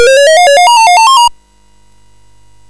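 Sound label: Mountain King, MOS 6581 SID chip music, games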